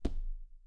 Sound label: Step on wood